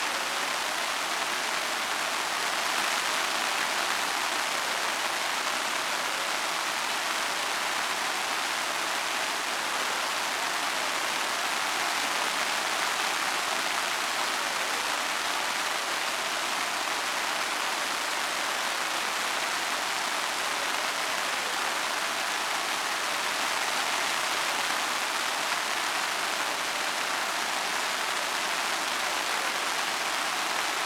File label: Rain Sounds